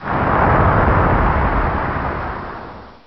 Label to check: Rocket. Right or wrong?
Right